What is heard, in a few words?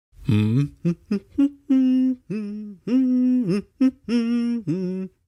hum